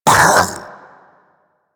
Infested Splitter Split Vocal Pain

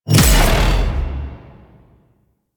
Sword Proj Explosion; Weapons; Melee; Warframe; Orokin Weave Sword; Weave Boss

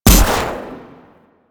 Electro Mag Trigger Explode, Weapons; Warframe; Corpus

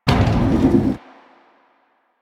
Sliding Door Open; Warframe; Ambience; Nineteen Ninety Nine

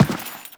Step Default, Footsteps; Warframe; Teshin

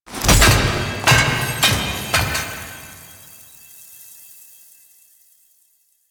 Mask Throw; Cinematics; Warframe; The New War; Throne Room

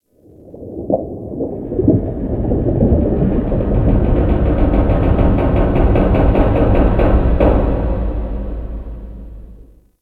Ocean Hull Creak; Ambience; Warframe; Grineer Ocean

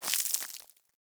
Settlement Sand Fine Debris; Ambience; Warframe; Grineer Settlement

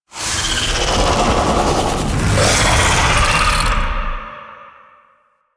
Vocal Spin Taunt A; Enemies; Warframe; The New War; Archon; Nira

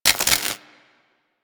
Worker Welder Start, weapons; Grn Worker Welder

